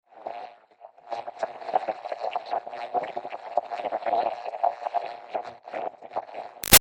Presence Frantic Interference, Communication; Sound Design; Big Room Sound

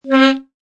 Sax Sound, A saxophone sound.; Horns; Musical

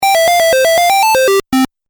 Game Music Phrase G, video game

